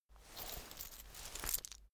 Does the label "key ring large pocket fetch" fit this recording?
Yes